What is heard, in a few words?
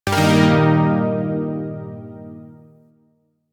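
Shawzin Pentatonic Minor Chord; Chords; Warframe; Zariman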